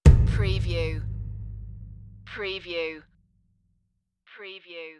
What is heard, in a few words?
Impact Mid Tom